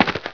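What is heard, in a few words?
dirt, footsteps; hl2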